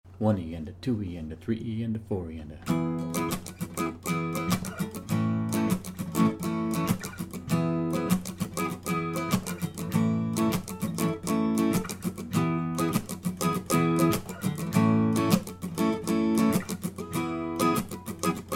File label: strum